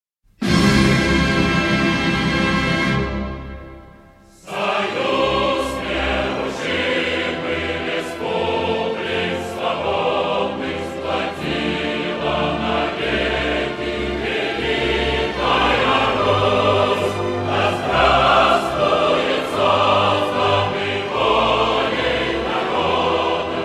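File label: anthem USSR alarm clock; gimn sssr budilnik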